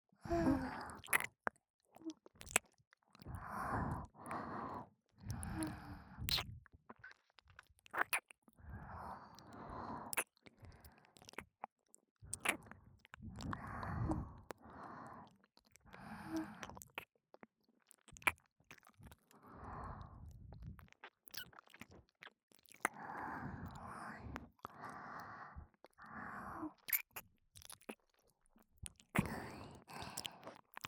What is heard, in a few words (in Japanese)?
おまけの蕩ける両耳舐め, ASMR; 反転